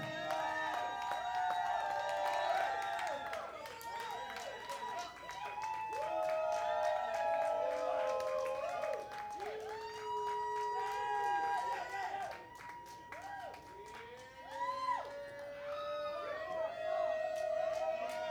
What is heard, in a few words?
Crowd Noise